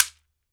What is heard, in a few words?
SHEKERE, African Perc; E-MU Producer Series Vol. 7 – Old World Instruments